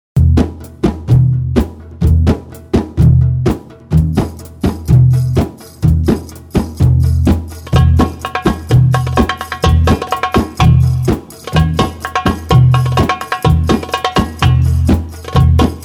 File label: Belly Dance Beat, oriental; Oriental Belly Dance Vol. 1(Darbouka & Tabla 2 Vol. 2)